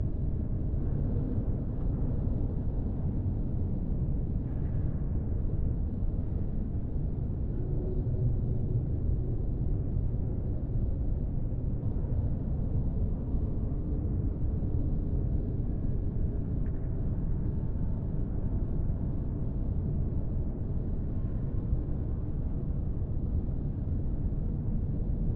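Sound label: Crowd Walla Museum Walla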